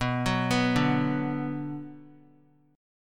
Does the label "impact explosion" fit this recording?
No